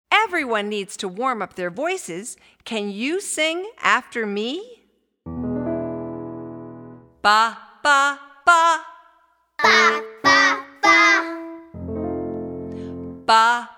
vocal warm ups, Warming up your voice for singing.